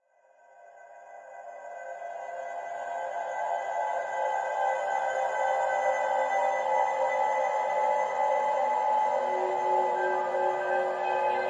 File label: Drones Shiver; Gothic Storm Music; Advanced Sound Design 2012